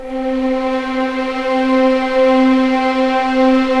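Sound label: C4 legato violins; East West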